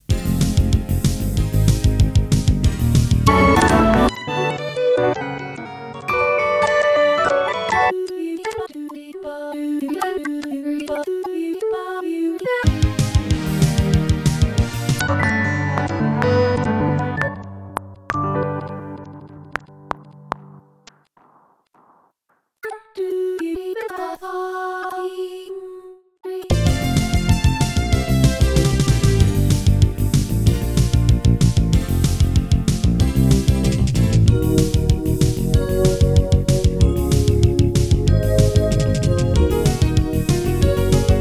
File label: Stage Music, midi